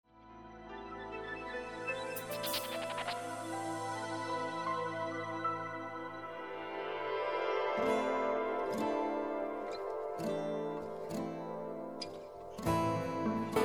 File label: Rhythm